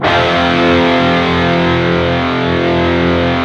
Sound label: PRS PC F#2, PRS PWR CHRD, Guitars